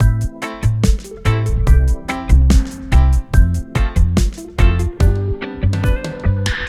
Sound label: Reggae